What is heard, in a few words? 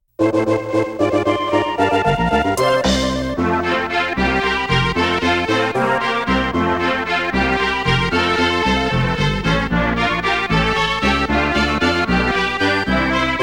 Water Waltz; TwinBee Rainbow Bell Adventure; Area 3- Underwater A